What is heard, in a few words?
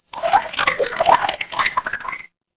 water swirl